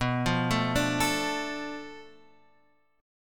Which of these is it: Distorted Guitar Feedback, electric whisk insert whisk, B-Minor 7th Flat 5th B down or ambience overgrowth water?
B-Minor 7th Flat 5th B down